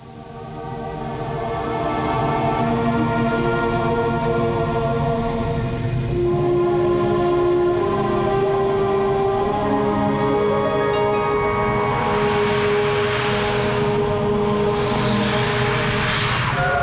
healing music

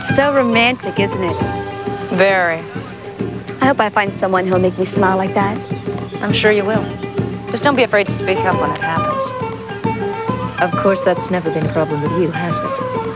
romantic

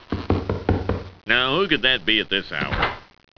knock knock; knock at door; Poindexter

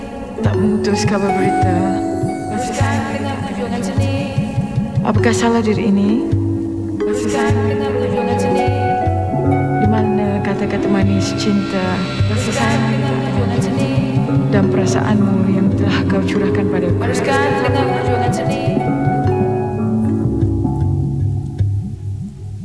chant; Priestess

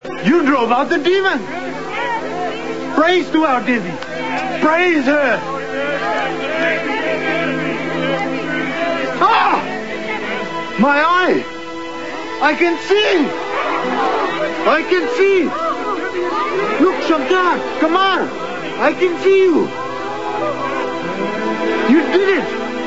crowd

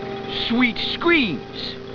screams; Collector; Sweet screams!

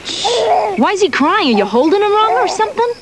crying

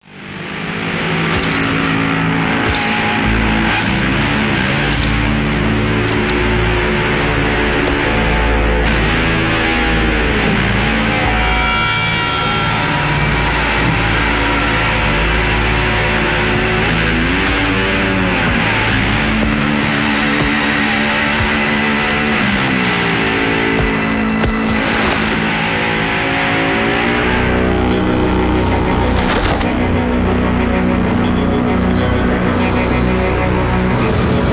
music

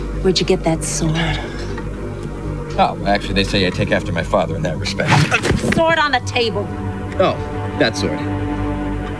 sword; Xena; Rafe: Oh, actually they say I take after my father in that respect. The sword on the table.

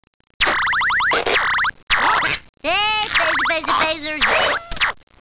phaser short; Bart; Hey, phaser, phaser, phaser....

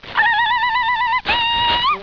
warcry; Xena's warcry.

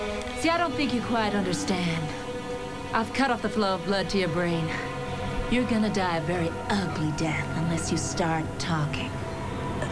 ugly death

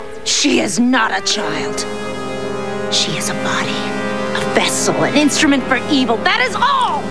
instrument

